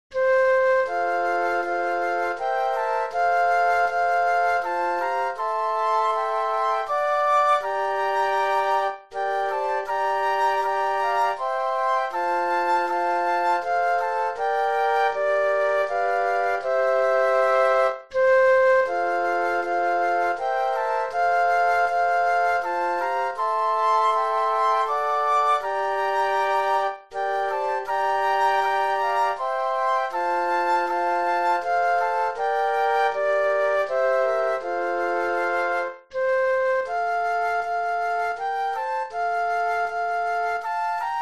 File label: Flute Quartet; christmas